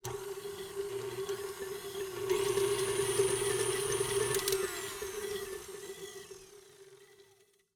Stand mixer, professional, multi speed, bowl, ramp up, power down; Eiravaein Works; Vaeyan IV